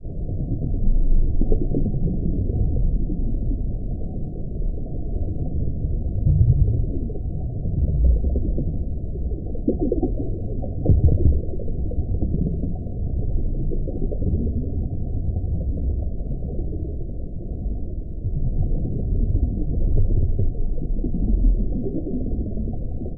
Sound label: water underwater deep; sound effects one